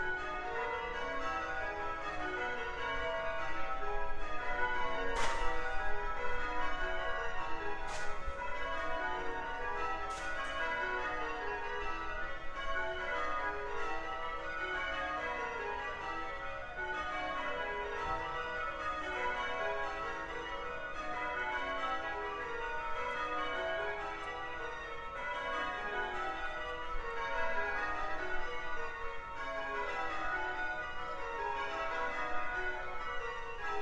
old st martin s bells; recorded with tascam im2 with zoom windscreen

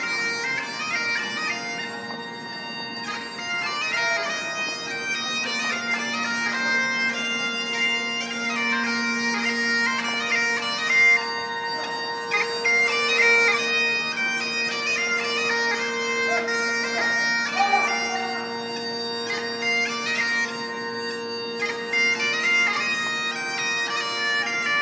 bagpipes in the street, Glasgow

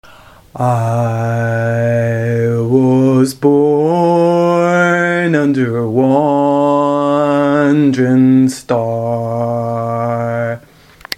singing with a cold

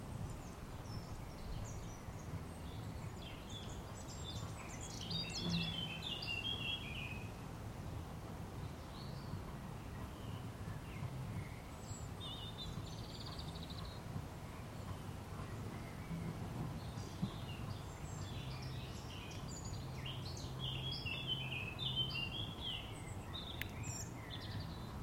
afternoon birdsong buzzy thing drum practice; with a cold on 17 May 2015 in my garden

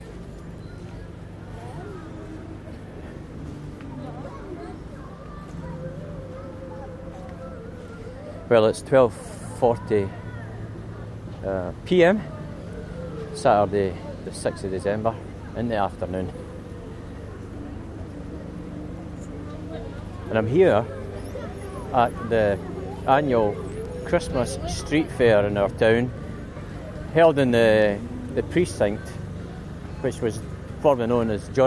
christmas street fair soundscape; bathgate christmas street fair 2014

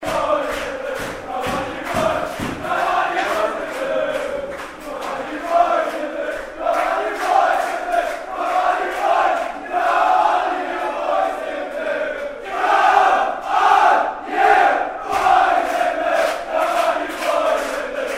hurlers celebrate; leinster win

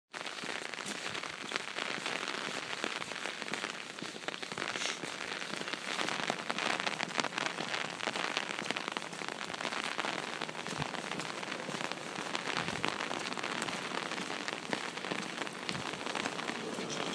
the sounds of boxing day rain and squelching feet

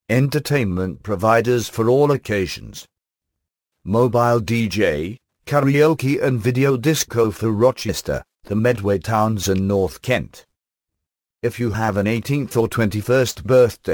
disco in kent

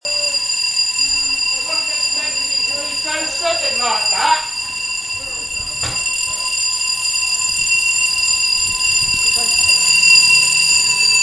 fire drill

